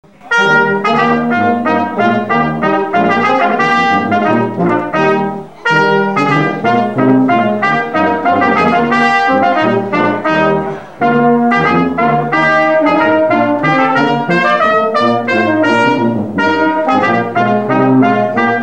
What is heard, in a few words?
Deck the Halls echo